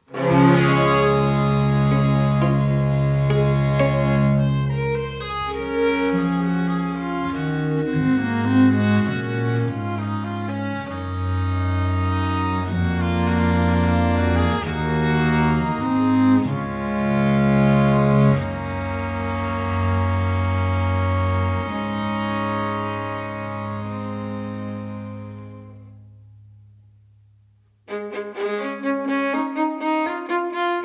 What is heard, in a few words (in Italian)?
quartetto in re maggiore adagio allegro moderato